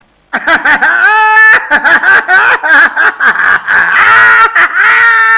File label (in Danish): sjove grin hippie